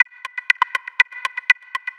Percussion, Trance NRG, Drums

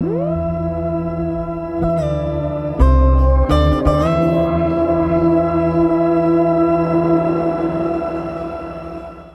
Bass FX

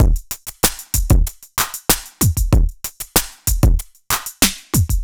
Electro Drums 95; musicradar

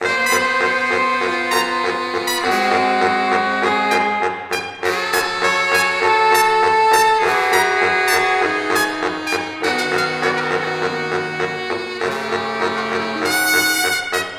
Horn Sect Effect

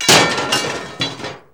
PAN DROP, Kitchen Counter; E-MU Producer Series Vol. 2; More Studio Essentials

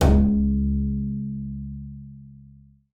BING C#2, BASS FINGER; Xsample VOL-5

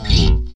Bass Chapman Stick; Roland LCDP02 Guitar and Bass

